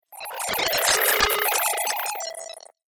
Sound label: Laser Gargle Whoosh; swish, sweep and swoop